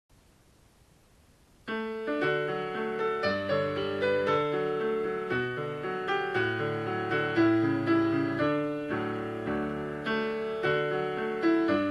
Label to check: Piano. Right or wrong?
Right